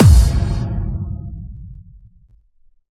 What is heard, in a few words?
FX Impact; Vengeance Samples